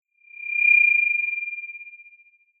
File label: Sound of an owl